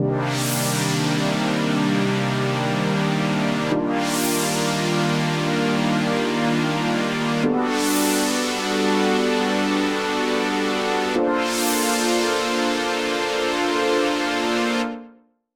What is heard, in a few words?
pads dry; 129BPM; Pony Fan Music Remix Stems